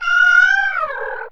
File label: CAT, Anime Sound FX; COMIC